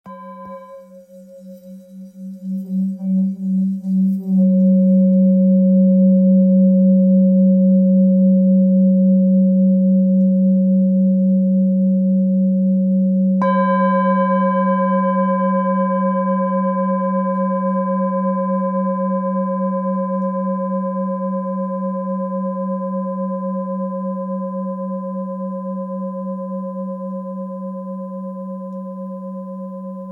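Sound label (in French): Therapeute sol, bols chantants tibétains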